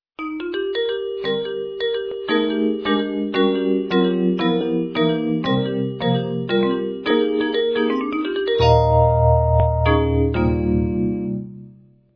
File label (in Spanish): vibrafono